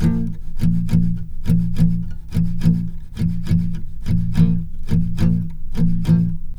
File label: B 2 R, Guitars, SLOW SHUF; Spectrasonics; Hans Zimmer Guitars VOL-1